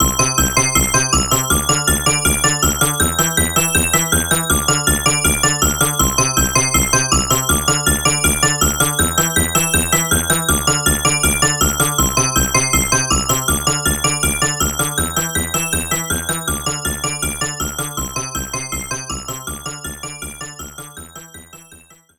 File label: Bonus Music; Bubble Bobble also featuring Rainbow Islands; Saturn